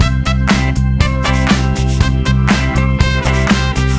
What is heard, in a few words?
Flourish; Happy Feet Two; NDS